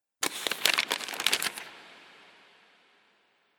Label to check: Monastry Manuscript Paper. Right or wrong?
Right